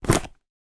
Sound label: household lunchbox pick up